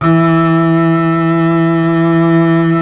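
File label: string contrabass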